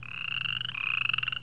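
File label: cricket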